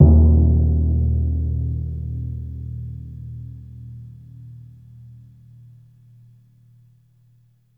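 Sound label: timpani pianissimo E1; Best Service; Peter Siedlaczek's Advanced Orchestra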